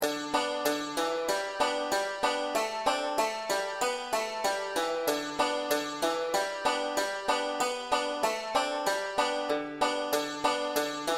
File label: g tuning w melody, tenor banjo; brethren we have met